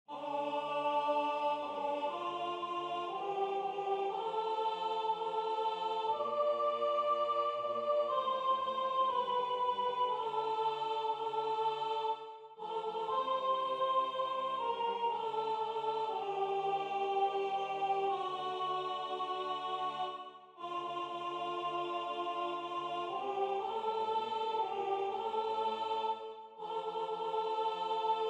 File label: soprano